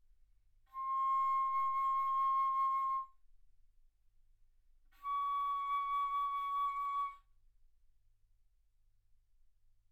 Bass Flute ff C6 Db6

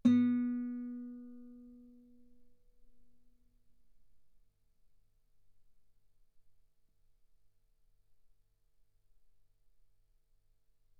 Guitar B3; ff; sul B

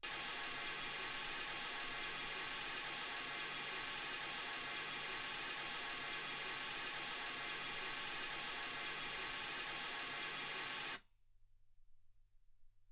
mls 48kHz

